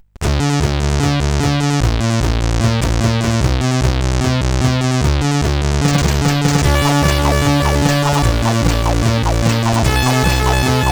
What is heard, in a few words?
sid CSG8580R5